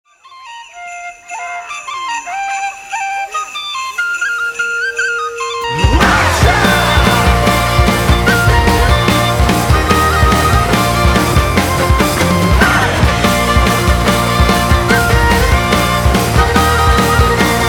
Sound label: Jingle Bells